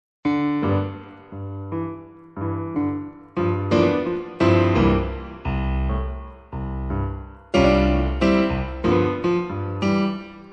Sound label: Blues; golden compositions vol7